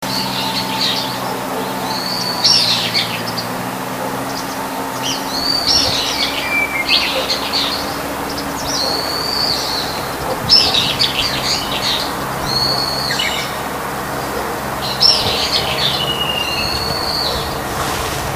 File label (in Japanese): uguisu